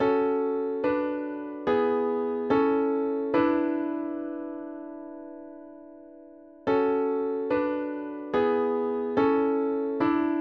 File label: instrumental